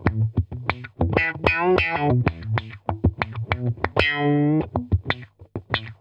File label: Funk Guitar; Zero G - Funk Guitar